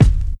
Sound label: fluffy bass drum g sharp key